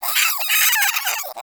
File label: Cryptilex Vocal Death; Characters; Warframe; Hunting Critters